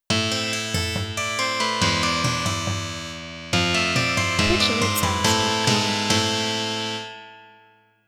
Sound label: medieval subscriber, Twitch Alert Sounds; Medieval Sound Pack